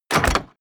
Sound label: medieval click medium negative